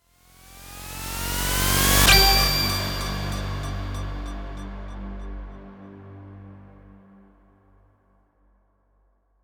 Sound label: ending 144bpm intro